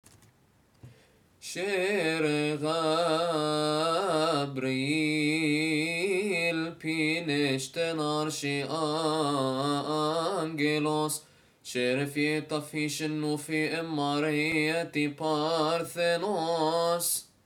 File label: verses of cymbals, hymns; Nativity Fast Kiahk